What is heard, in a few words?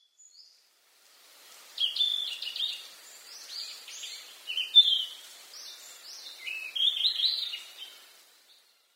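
Yellow-rumped Flycatcher; mamijirokibitaki; koe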